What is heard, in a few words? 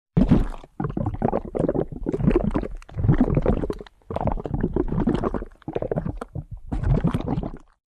Bubbles in the water